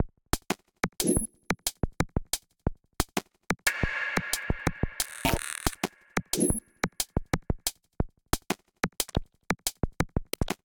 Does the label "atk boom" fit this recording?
No